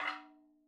madalam notes L; Sample Banks; Percussion; MADHALAM

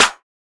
Clap, Percussion; Sample Banks

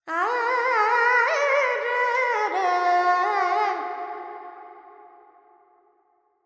Verb Dorian, Vocals, Carnatic Female, Alaps, 70 G

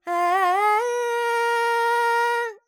Dorian, Vocals Carnatic Female Alaps 70 G Dry

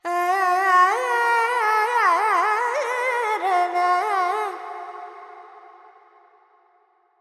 FX Dorian, Vocals, Carnatic Female, Alaps, 70 G; Sample Banks

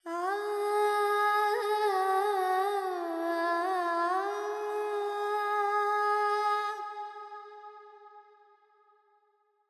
FX, Vocals, Carnatic Female, Alaps, Charukesi

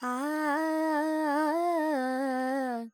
Pentatonic, Vocals, Carnatic Female, Alaps, Dry

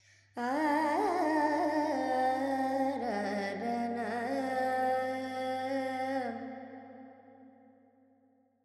Carnatic Female Alaps, Lydian, 70 G, Verb; Vocals; Sample Banks

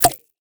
Perc; Sample Banks; Percussion

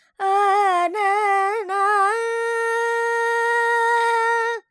Lydian, Vocals, Carnatic Female, Alaps, Dry

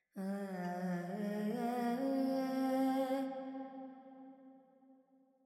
Pentatonic, Vocals Carnatic Female Alaps 70 G

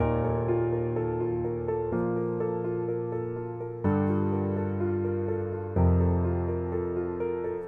Piano Catchy Arp Gm 125; Sample Banks; Melody; Keyboards

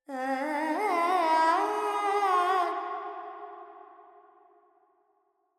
Carnatic Female Alaps, Purvi Kalyani, 100 G, Verb; Sample Banks

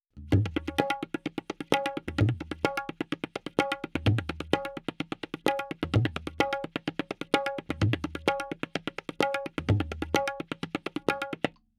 Tabla C 4-4 128, Percussion; Sample Banks

